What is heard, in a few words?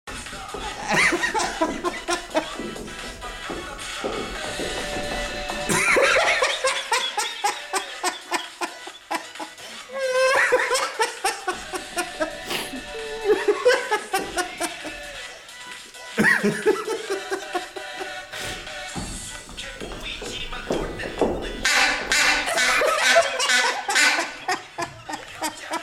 laughter